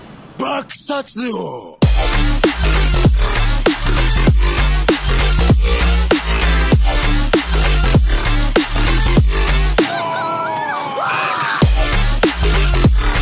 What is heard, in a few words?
Remix Anime Music